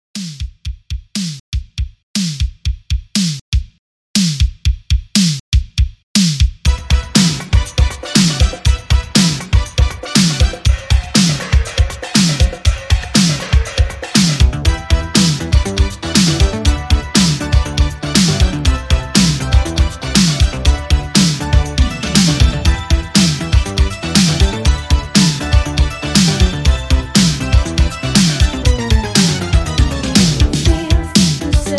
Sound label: 80s Dance Pop Re Drum 120